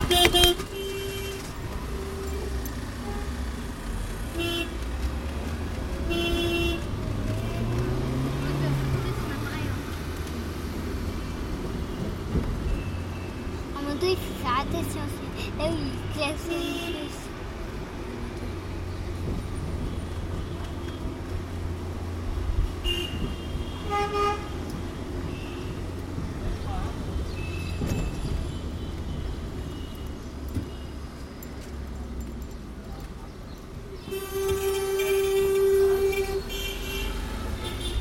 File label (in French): klaxon a Mumbai, Inde